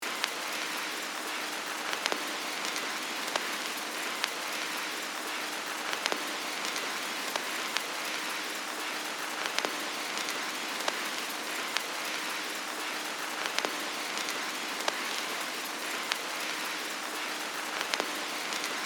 Film reel old projector vintage crackle; film and tv

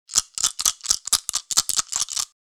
Dice shaking slow board game

